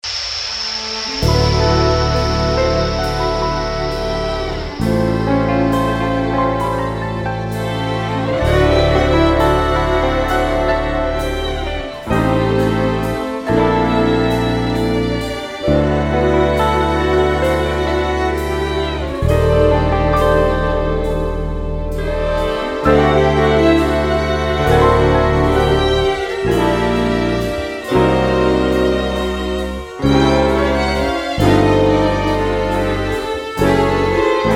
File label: orch